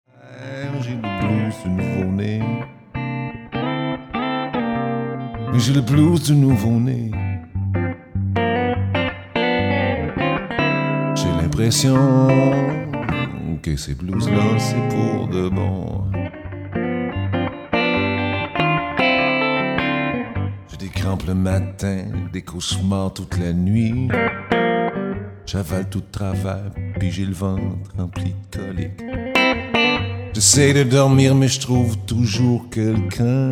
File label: guitar voix